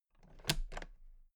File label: toolbox latches close soft double; industrie wirtschaft; handwerk